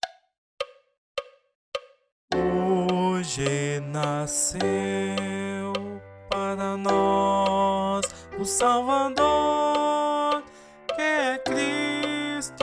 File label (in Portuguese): Coro